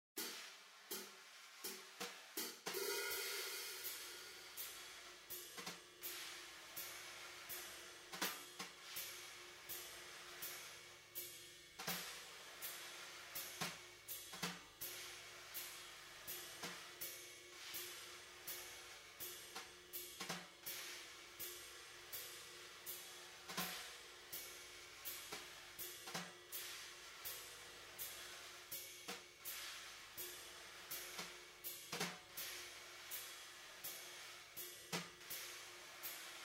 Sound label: All Black Keys I Pattern B Accompaniment